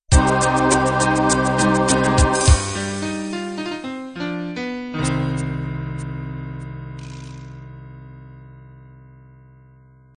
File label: natural beat end